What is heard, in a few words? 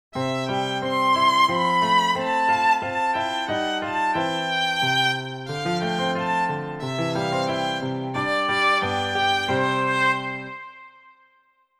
VIOLIN; secretrings; MIDDLE CLASS